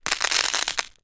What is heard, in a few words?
leisure fuse beads drop sprinkle plastic tub lid or tray; zapsplat